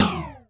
Thang, Alesis D4

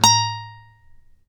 acoustic A#4 L, guitar; East West; Steve Stevens Guitar Samples Collection; ACOUSTIC PCK

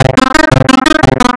PPG Riff; AMG - Prototype Drum & Bass

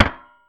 Percussive Hit, contact mic

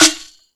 Percussion FX, Drum Machines

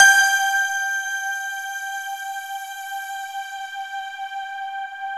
JV-1080 BELLS PNO; PIANO-EXP; Syntec; Wall of Sounds

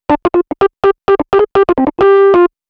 Rhodes Solo, Cinematic Funk; Sony Creative Software; Urban Tone Poems

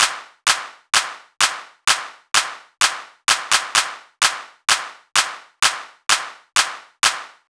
Drum Loop 128BPM Clap; Loop Elements; VEC5; Drumloops; Multi Loops

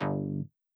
Bass shot G; Multi Drum Loop 17

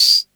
CR78 TAMB; Roland CR-78; Roland CR-78 Ableton Project

